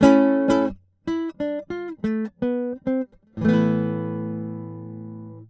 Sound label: filt nylon stacato